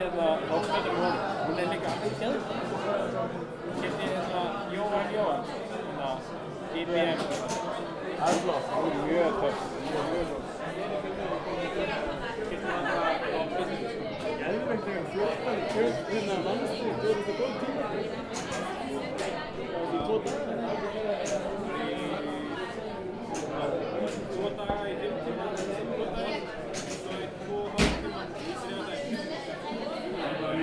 Bar noisy ambience

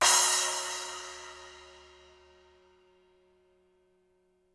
splash

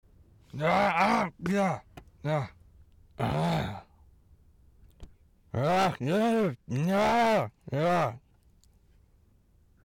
Man Fighting Grunting

